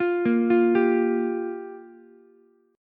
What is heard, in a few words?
G Minor 7th, Ukulele; Gm7 arpeggiated; JGuitar